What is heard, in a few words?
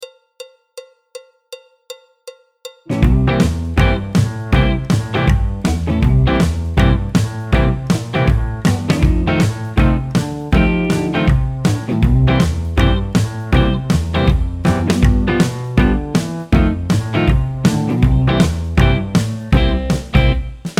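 backing track, Jazz; clarinet, flute; Jazz Syllabus Level 1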